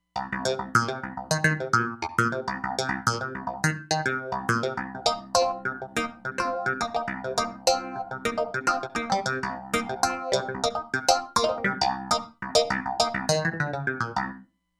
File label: Snappy Clav, Roland Fantom XR